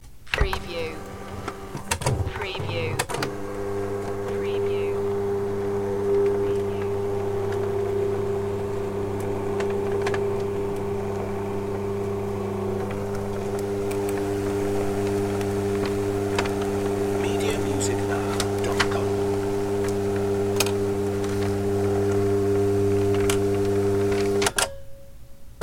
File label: Fax Machine